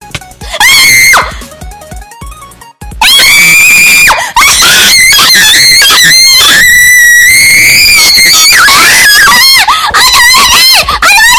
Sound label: laugh; Haato